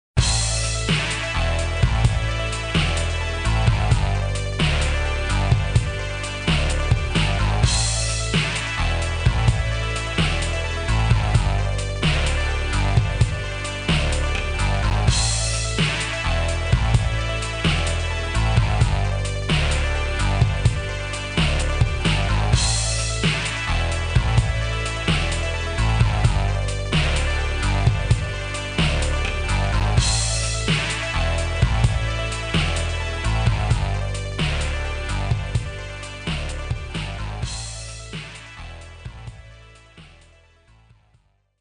techno; Techromancer; tatsumi techno dome

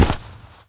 warrior walk carpet

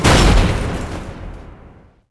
Crash

